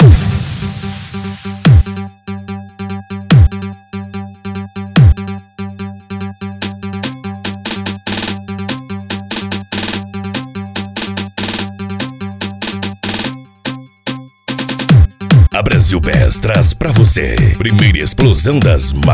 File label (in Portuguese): explosao das maquinas bass